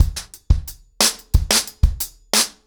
ACOUSTIC DRUMS, 90 BPM

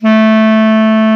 KLARINETT A, Alpine Volksmusik

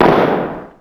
Clap, Ensoniq ASR-X Pro

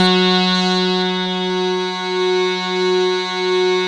CS-15, BASSES

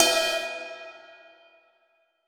Ride Cymbal; Essential Percussion

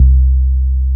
bass, 200 BPM, Drum & Bass; Ueberschall; Drum & Bass Resonance

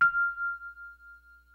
DYNO RHODES, E-PIANOS; Kid Nepro - Elite

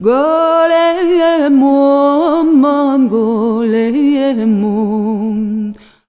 VOICES; USB Soundscan vol.63; AKAI

